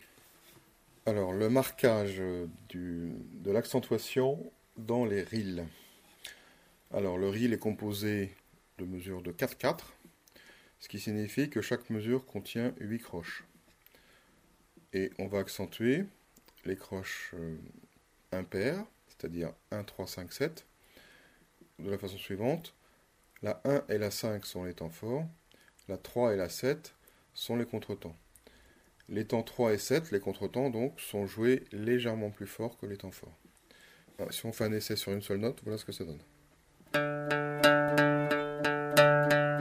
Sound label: accentuation reel, banjo